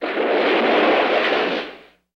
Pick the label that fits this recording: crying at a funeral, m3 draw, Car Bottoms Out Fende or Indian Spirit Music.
Car Bottoms Out Fende